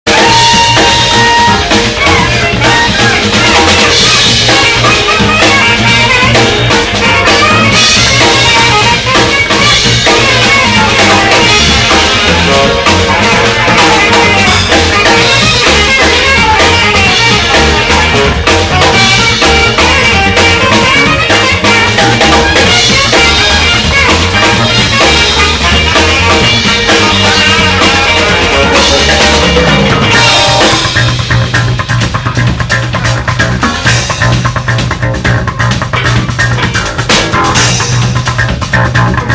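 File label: Fender P Bass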